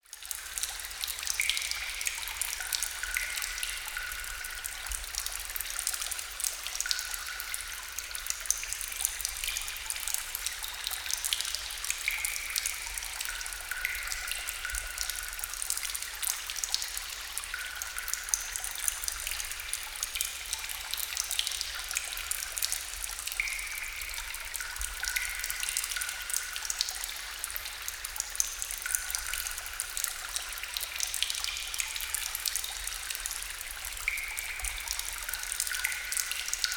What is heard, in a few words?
water drips; KriptoFX; WaterSystem